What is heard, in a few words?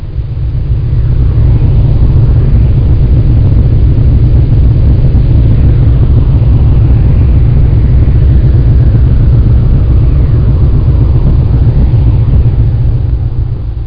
HANGAR; Deep Throat Girls 4